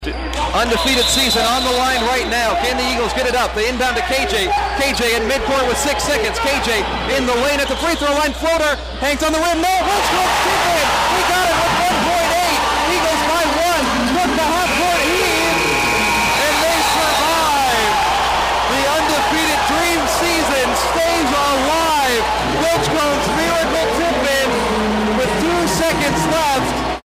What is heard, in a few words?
tipin at buzzer